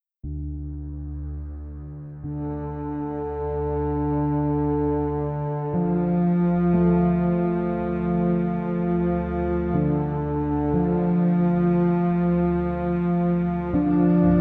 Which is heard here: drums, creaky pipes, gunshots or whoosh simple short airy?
gunshots